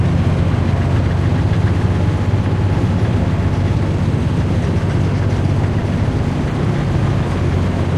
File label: tank engine int rpm load; War Thunder